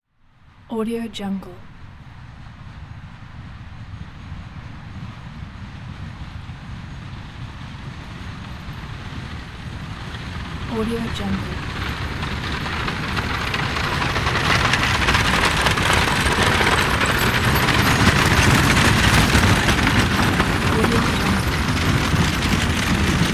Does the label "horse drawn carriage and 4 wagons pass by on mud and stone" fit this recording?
Yes